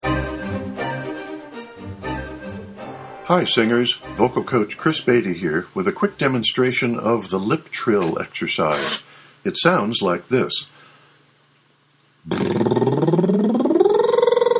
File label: Lip Trill